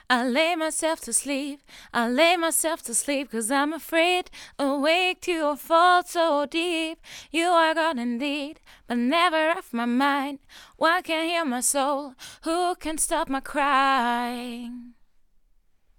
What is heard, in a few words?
vocals, Fame F47